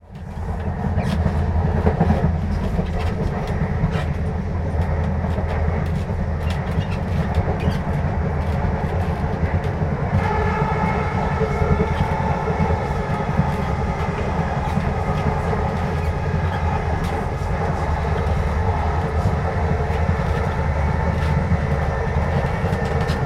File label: Train Travelling Between Carriages